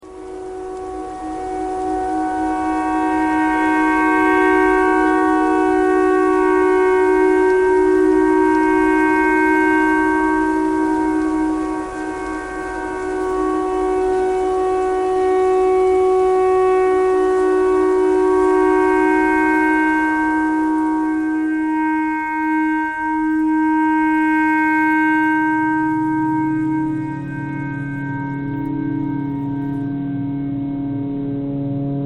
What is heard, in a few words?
clarinet tornado siren thread pentatonic